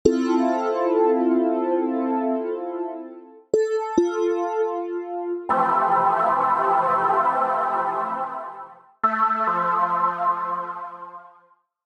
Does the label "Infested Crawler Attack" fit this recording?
No